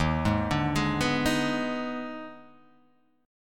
D sharp Major 7th Suspended 4th Sharp 5th, Guitar, Open G; chordshape